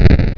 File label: explosion